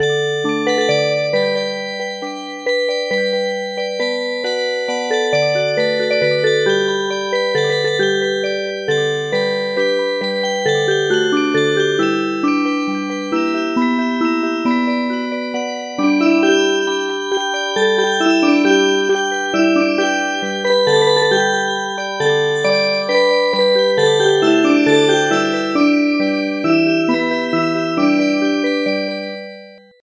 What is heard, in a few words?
Music Box